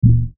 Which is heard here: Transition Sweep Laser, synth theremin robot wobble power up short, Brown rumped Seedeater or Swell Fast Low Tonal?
Swell Fast Low Tonal